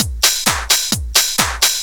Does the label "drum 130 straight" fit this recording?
Yes